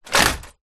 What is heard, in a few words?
vehicles bicycle small childs drop down on others